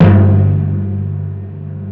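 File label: tympani; East West; Global Sounds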